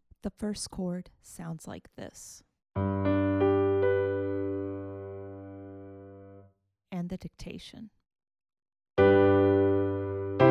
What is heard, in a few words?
harmonic dictation